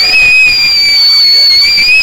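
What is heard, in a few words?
saxophone, BRASS KIT; Big Beat Samples & More; AKAI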